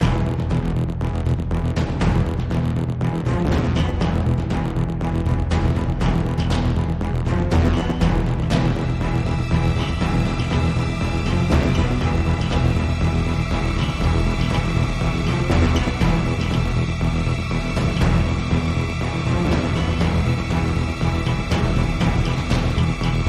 Cinematic Action Tension; Bootflare

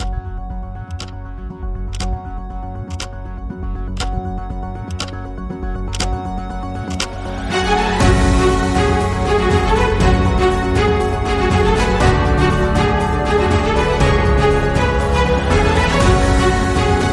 Sport broadcast intro; Bootflare

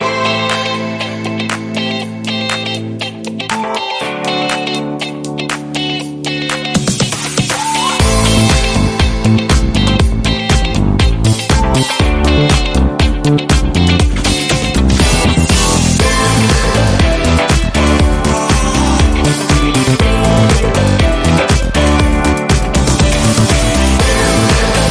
Funky background

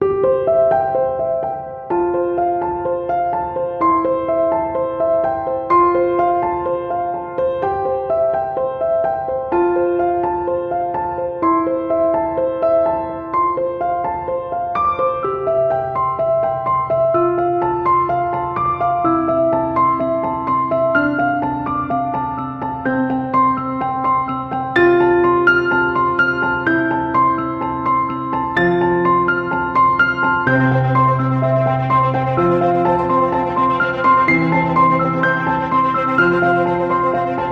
Inspiring rising orchestral piano

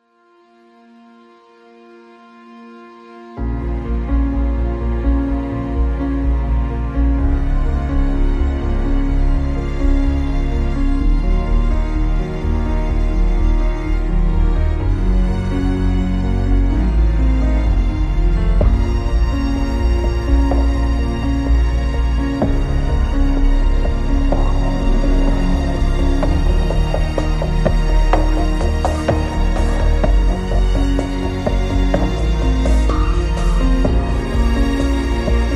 Elegant drama building; Bootflare

